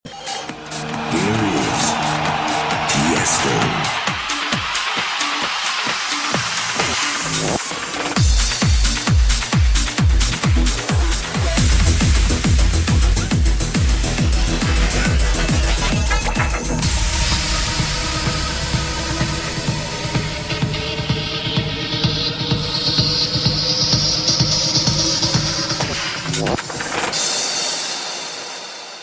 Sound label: magic music, ringtones